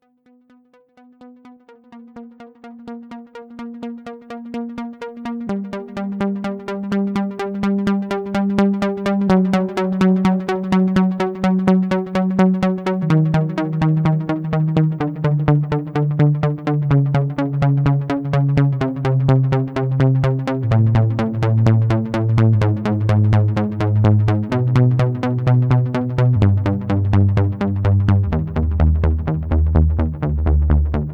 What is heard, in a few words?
psychodelic